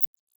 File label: Pipistrellus nathusii, batcalls, europe